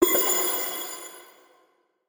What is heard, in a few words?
Coin Hit; we are the dwarves gamerip 2016